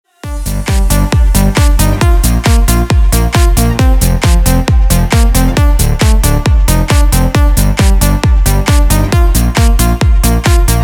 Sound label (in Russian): ritmichnaya narezka